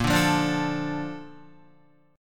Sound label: A sharp Major 7th, Guitar; strummed; 4F,4A,5C,5G,5C,6E; JGuitar